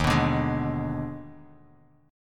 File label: D sharp Major 7th Suspended 4th Sharp 5th; strummed; JGuitar